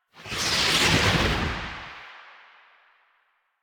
Bullet Jump Whoosh, Motorcycle; Motorcycle Ninety Nine

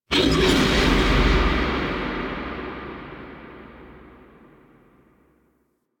Strike; Styanax Tharros; Warframe; Vitruvian Museum; Warframe Powers; Leverian

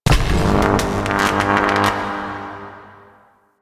Electricity Spark; Warframe; Ambience; Entrati

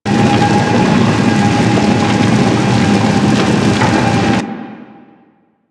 Heat Vent Loop; Ambience; Props; Warframe; Grineer Galleon

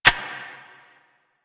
Sniper Low Clip, Weapons; DE; Warframe; Tenno; Quad Sniper

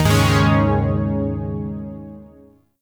Yo Chord B, Zariman Shawzin; Music; Warframe

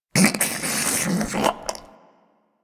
Close Goop Release; Ambience; Warframe; Infested Eris

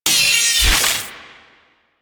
Turret Minigun Close; Warframe; Zariman

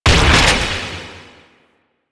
Shot, Weapons; Corvas Prime

